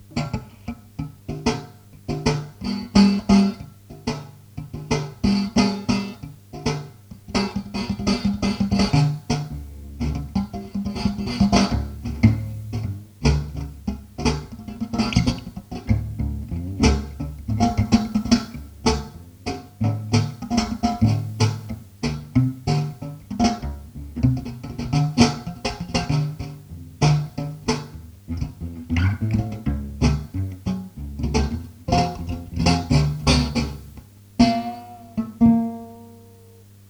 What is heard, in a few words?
guitar; prepared acoustic guitar II; Radio broadcast by Danish State Radio; solo guitar; acoustic guitar prepared with paper